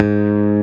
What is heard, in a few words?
bass